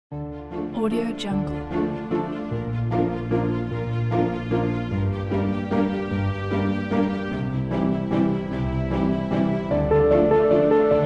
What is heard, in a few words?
fairytale waltz